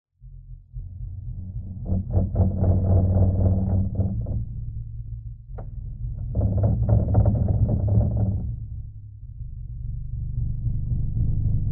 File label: vehicle underwater or space resonation slow; lukas tvrdon